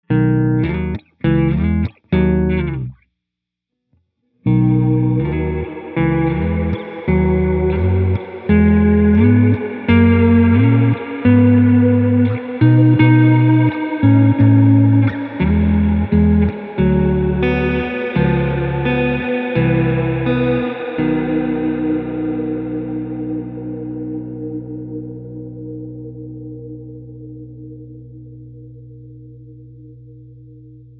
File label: Ghost Baritone, reverb